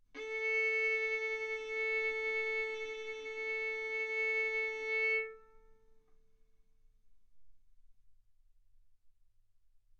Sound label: Violin arco A4 B4; mf; sul A; stereo